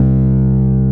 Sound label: Bass Note C